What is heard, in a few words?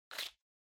Match (Open)